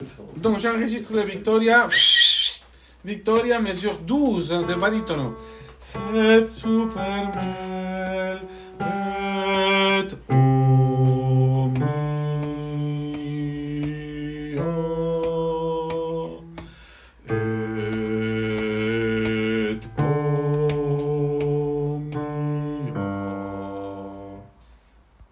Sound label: Jesu dulcis memoria basse; mesures 12-17; Victoria